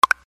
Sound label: xiaomi 13t notification